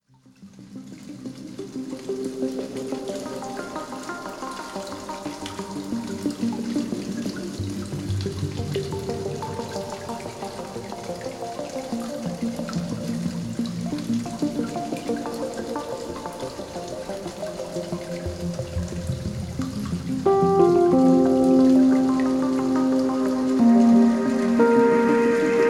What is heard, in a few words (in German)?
Entspannungszeit Melodien der Natur; gefuehlvolle entspannungsmusik